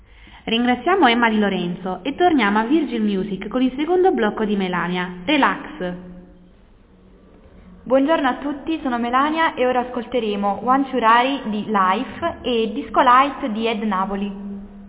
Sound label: music